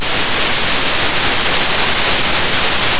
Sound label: Sound of a thunderstorm